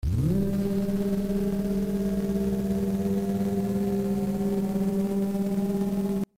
charge; ff3; ff3us

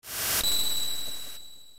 save ting; ff3; ff3us

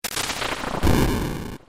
boss death; ff3; ff3us

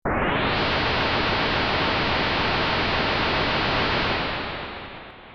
long wind; ff3; ff3us

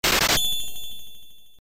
buying selling; ff3; ff3us

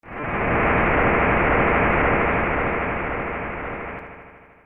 big wind; ff3us

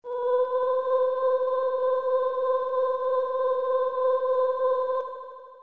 eerie; ff3us

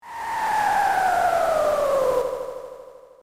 falling down; ff3; ff3us

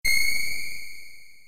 rod hit; ff3; ff3us

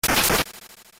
ice 2 part 2; ff3; ff3us

